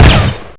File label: psg1 fire, weapons; firearms